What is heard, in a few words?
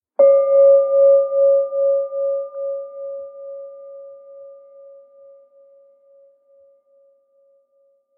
Meditation Bell